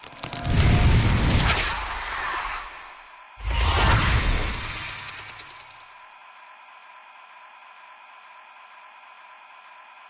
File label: free spin animation; dracula